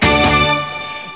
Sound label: jingle